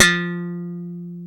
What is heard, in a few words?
F-0 SLAP, SLAP BASS; AKAI S-Series CD-ROM Sound Library VOL-7; SLAP BASS 1